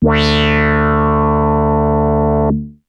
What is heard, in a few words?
Arp A D2, ARP Odyssey synth; musicradar-synth-samples